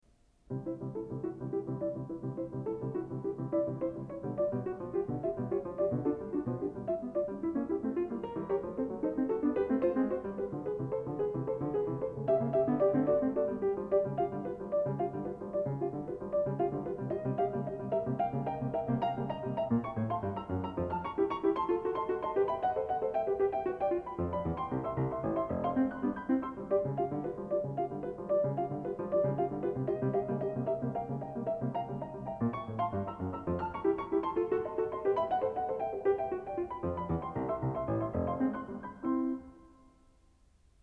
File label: 33 Piano Variations in C; Anton Diabelli